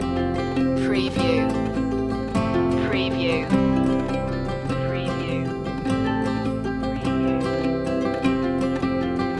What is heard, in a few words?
the nu-folk tune loop